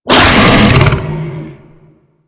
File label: Infested Bone Blade Vocal Mortar C; Warframe